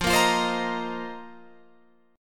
F 7th Suspended 4th F down Guitar Open F

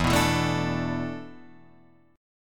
E 7th Suspended 4th, Guitar, Drop D

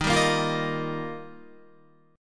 Eb Major Add 9th, Guitar; Ebadd9 strummed; JGuitar

